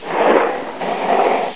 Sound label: Roller Skates; PC; MARIOSFUNDAMENTALS